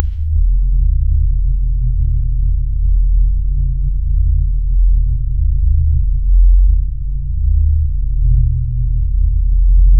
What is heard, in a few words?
pipe airflow drone smooth